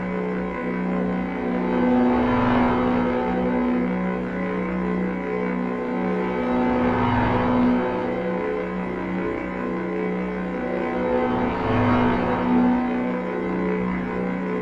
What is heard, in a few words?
AMB SCI-FI LOOP; gamerip; strike team gladius; ps4 ps5 switch windows xbox one